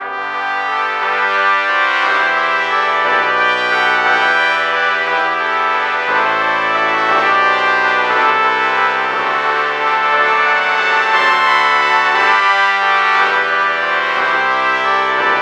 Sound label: trumpet trumpet lpc false bach morph